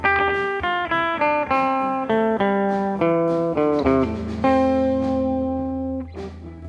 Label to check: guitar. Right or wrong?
Right